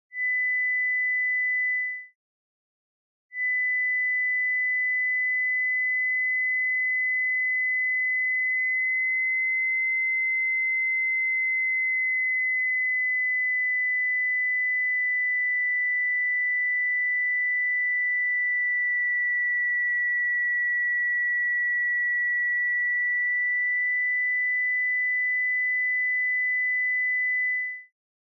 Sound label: sine 2kHz Repitched +-50 cent